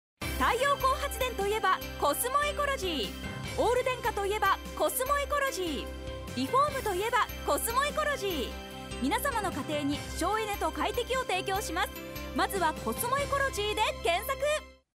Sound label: radio